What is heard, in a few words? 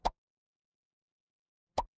cartoon pop